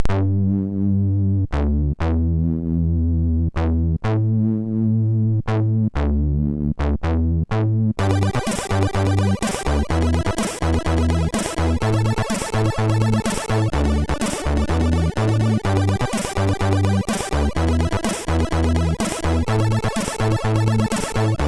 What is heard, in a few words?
Circular Letter tune, MOS6581R4